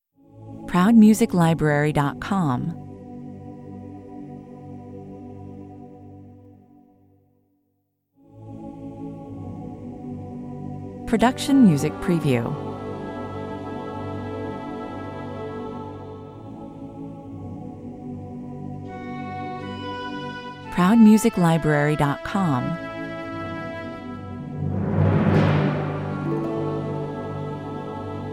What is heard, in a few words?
rainbow song 100 bpm